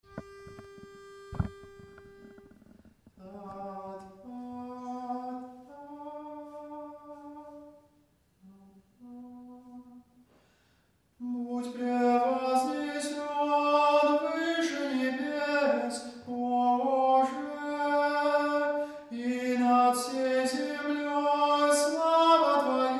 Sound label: tenor; bud prevoznesen